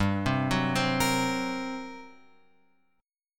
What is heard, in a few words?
G Minor Major 7th Double Flat 5th G; GmM7bb5; {3 3 4 5 X 6} arpeggiated